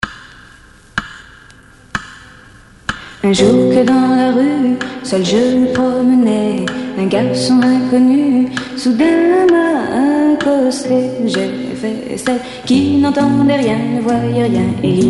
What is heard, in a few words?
Sexy French Song